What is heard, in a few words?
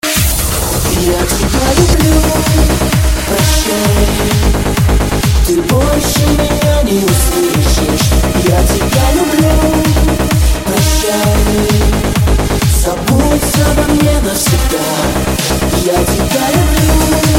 Electro